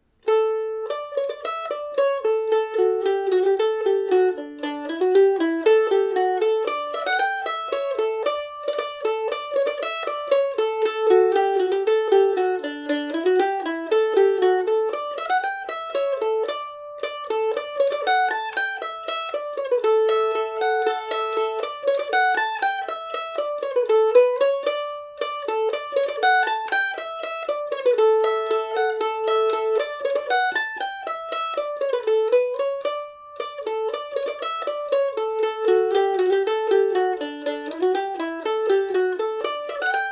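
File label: din tarrants polka D major, mandolin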